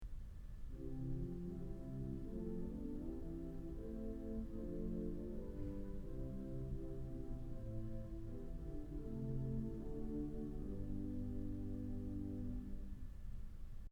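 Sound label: Swell Flute Celeste; Kellogg Auditorium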